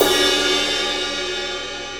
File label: 20 inch fusion powerful crash, cymbal; sound caster; Meinl